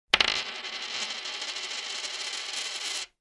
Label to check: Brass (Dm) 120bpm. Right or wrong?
Wrong